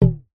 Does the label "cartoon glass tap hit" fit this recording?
Yes